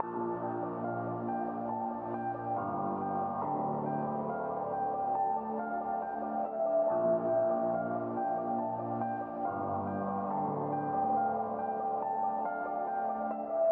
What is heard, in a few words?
Melodic Piano